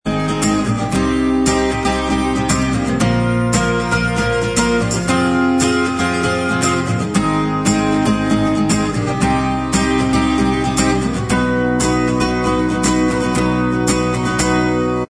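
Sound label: acoustic instrumental track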